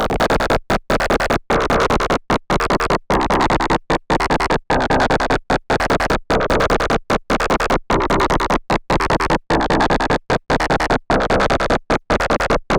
Sax Attack, acid techno trance; acid techno trance vol2

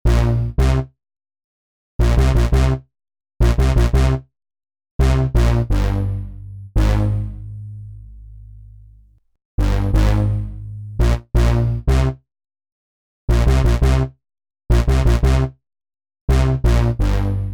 Discordant Seal, analogue stab

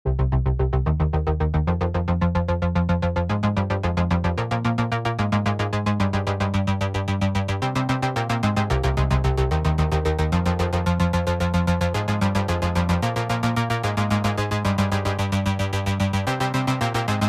80s Sequenced Bass, retro synth wave; retro 80s synth wave presets abx3 vol1

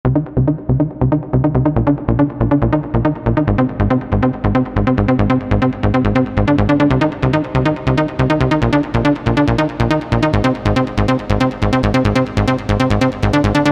Trance; abx3 trance presets vol1

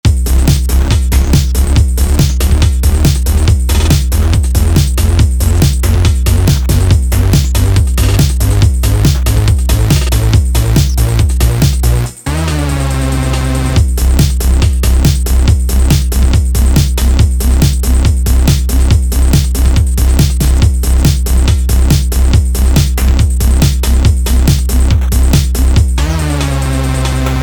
Hoovers with Drums; junorator

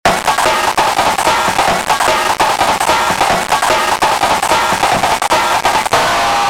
Rythm Destroyer Wet; distoblast

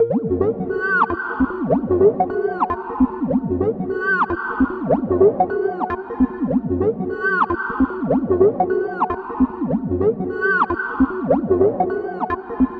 Underwater, acid techno trance; acid techno trance vol2

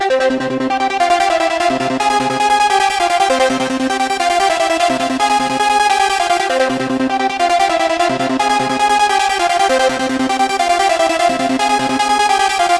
Lead, trance; abx3 trance presets vol2

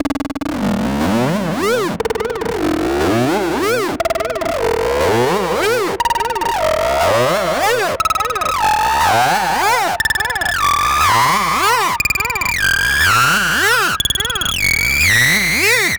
Acid Fx, 303 Bass Line Synthesizer; bassline synthesizer plugin inspired by the legendary 303 basslin; AcidBox V2

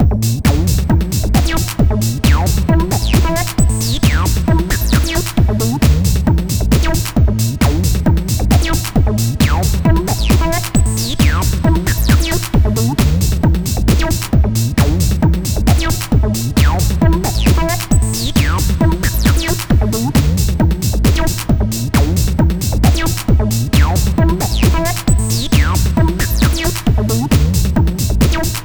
Reverbed, acid; 100 acid vol 1

